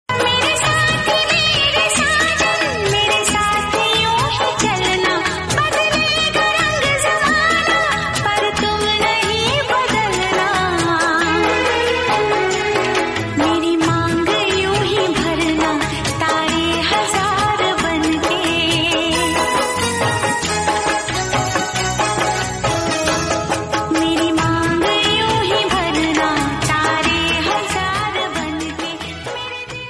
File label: romantic hindi